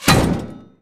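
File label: shield impact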